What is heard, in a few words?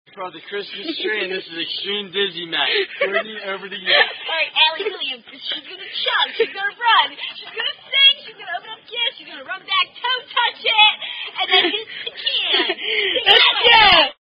Dizzy Stick With Drinking Beer From Plasic Bat And Spinning Drinking Ga; Extreme Dizzy Bat